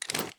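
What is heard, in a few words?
pistol 1911 fast reload lift; gmod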